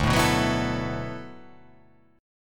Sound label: D 6th Add 9th D